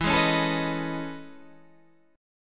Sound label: A Major Sharp 11th E